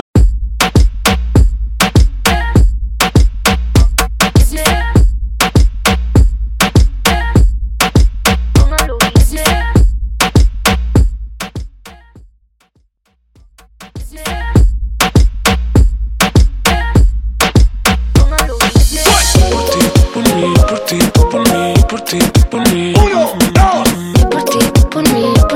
Moombahton 100 Bpm; Mainstream VIP

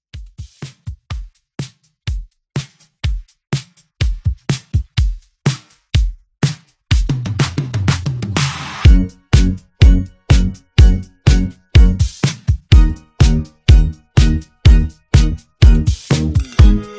Club 124 CLEAN; Club Edit

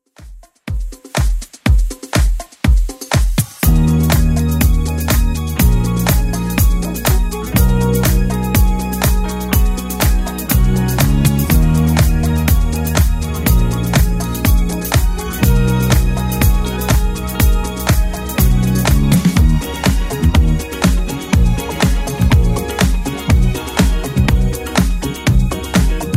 80s Club Edit 122 bpm

